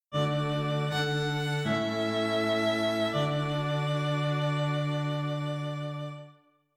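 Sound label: string quartet, dictation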